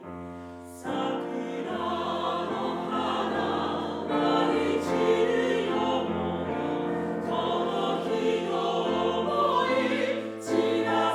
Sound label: music sample; PCM48kHz16bit; SONY PCM-D100